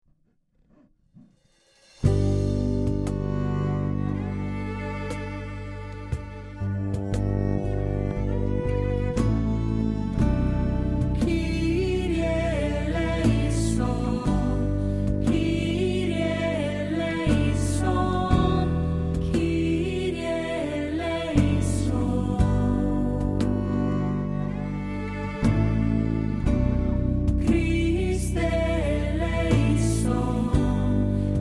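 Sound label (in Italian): kyrie basso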